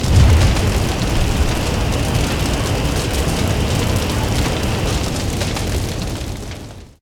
forge burn; smite; vulcan

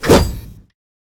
swing; smite; kuzenbo

